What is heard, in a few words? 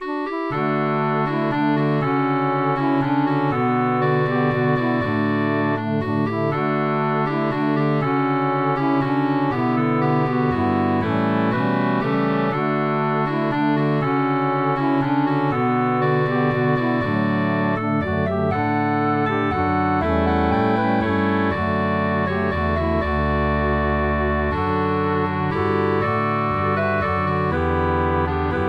Waltz Melody Harmony Bass; Soldiers Joyful Waltz